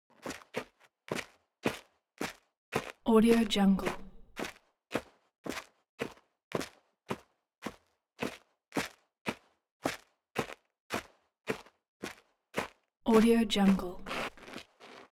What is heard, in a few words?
foley step sneaker concrete grit upstairs sequence; smartsoundfx